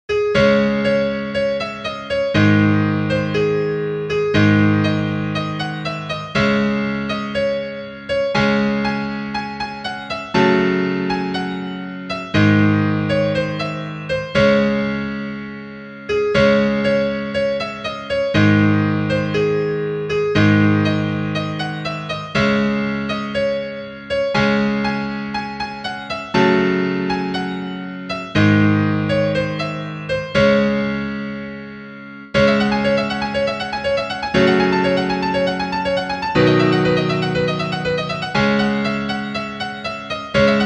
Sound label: guitar solo